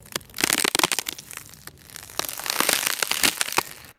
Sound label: low pitched ice crackling and crunching